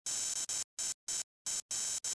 fuelpump

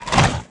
Attack Slash, Mutant

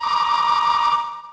phone ring

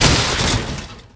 chair

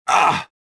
choke; reborn

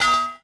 pipe hit concrete; objects; Star Wars Obi-Wan; XBOX

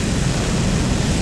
flame thrower loop

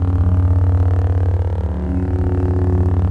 saber hum; weapons

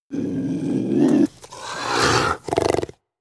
Cougar Vocal; Animals; XBOX; BassProShopsTrophyHunter2007

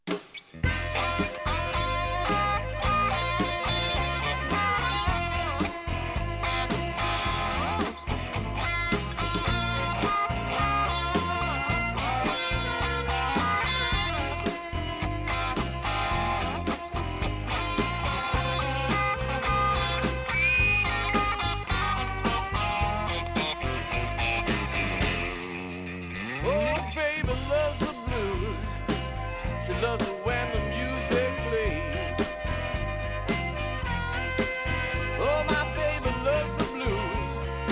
BLUES; CHAKRA; Big Mutha Truckers

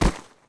bodyfall grass, foley

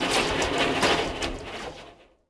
suspension cables move

